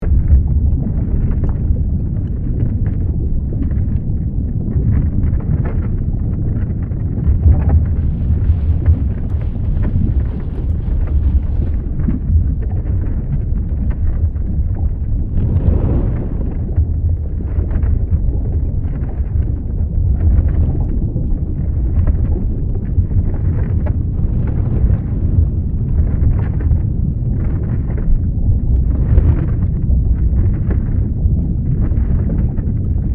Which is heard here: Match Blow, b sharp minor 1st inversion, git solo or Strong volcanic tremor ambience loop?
Strong volcanic tremor ambience loop